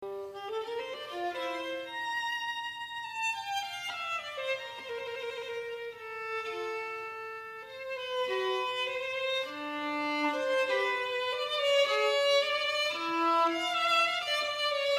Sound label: violin